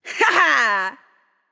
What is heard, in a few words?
nv yay; smite; awilix